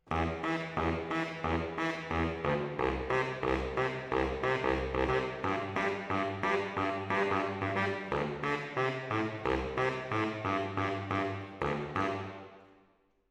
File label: MKH AB, piano